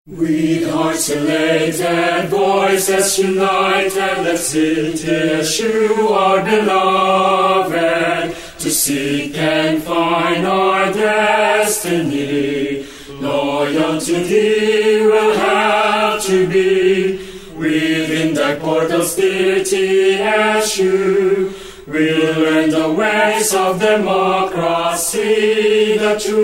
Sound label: hymn